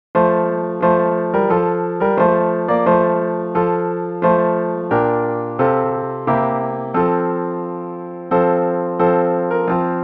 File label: trumpet